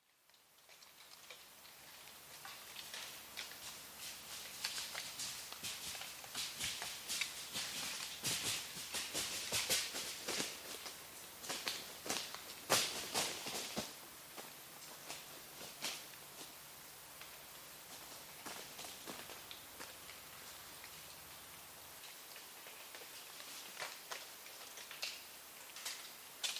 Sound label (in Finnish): metsäjänis; nisakkaiden aania; lauri hallikainen